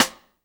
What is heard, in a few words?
sidesticks; Spectrasonics - Backbeat